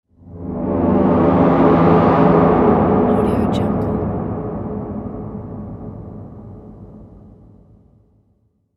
dark threatening wave

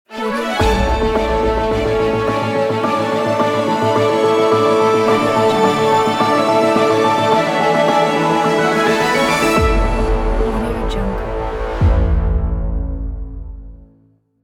news opener ident

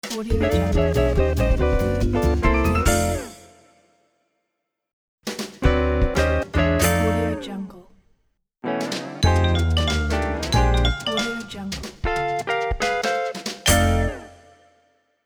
upbeat jazzy guitar logo pack; drtimwaters

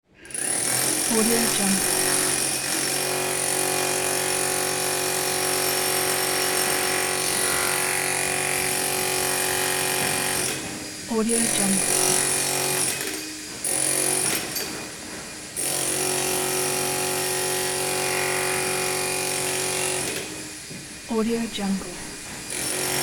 construction site drilling; hollywoodedge

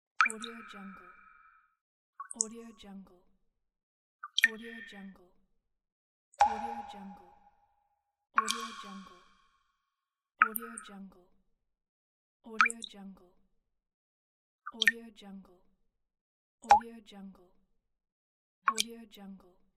water drops pack

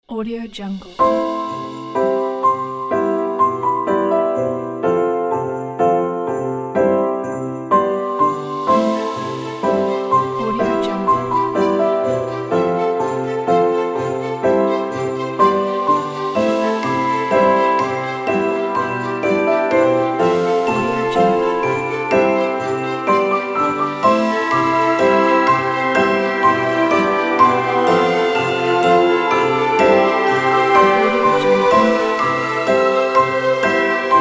christmas is coming; okstockmusic

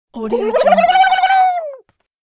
cartoon turkey win

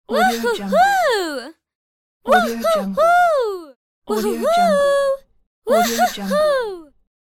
teenage girl woohoohoo pack

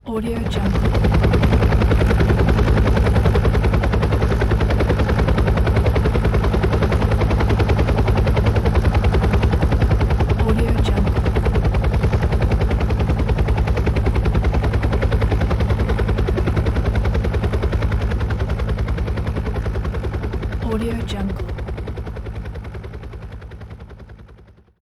the helicopter

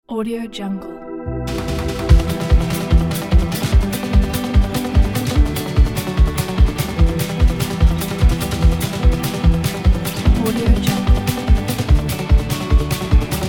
fast country song; othersongs

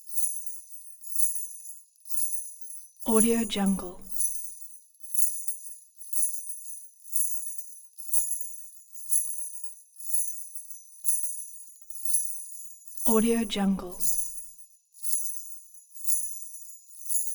christmas loop sleigh jingle bell hanging slowed; esmteam

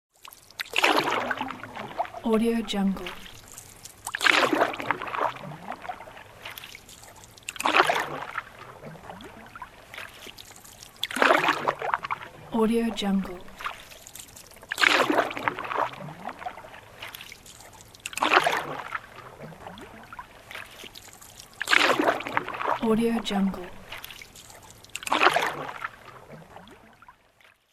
rowing boat

